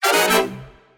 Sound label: free opened; pork knox